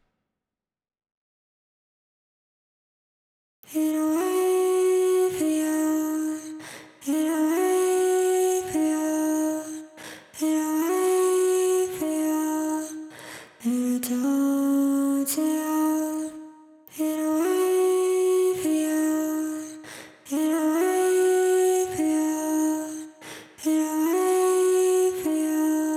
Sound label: vox